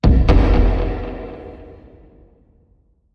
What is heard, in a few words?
Hit Low Double Delay